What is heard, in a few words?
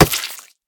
Hit; smite; god raijin